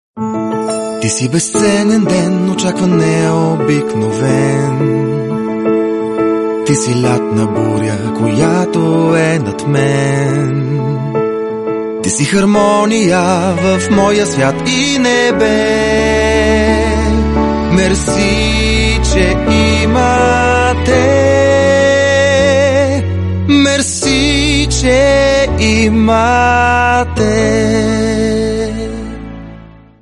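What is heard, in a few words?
Bulgarian, male, singing; voice over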